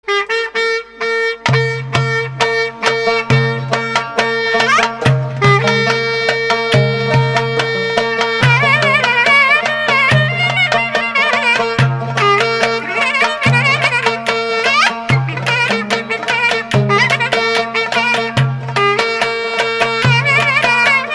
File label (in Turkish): ciftetelli